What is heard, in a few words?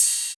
Open Hihat